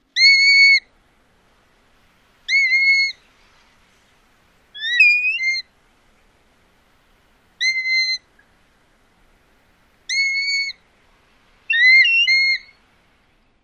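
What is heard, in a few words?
Black-bellied Plover more calls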